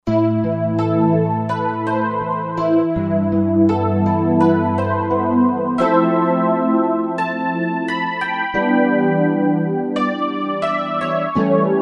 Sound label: Waterhodes; Roland JV-2080